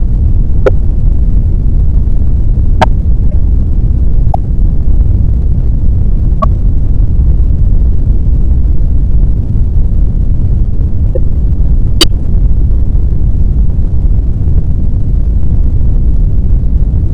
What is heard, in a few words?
AIRPLANE; pictcode